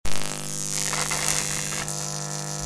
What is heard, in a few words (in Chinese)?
电流滋滋; 能量音效